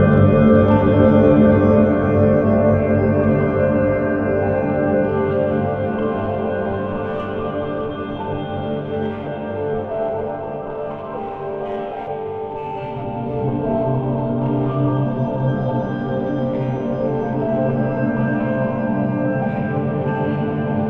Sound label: Ambient Loop Piano Long Synth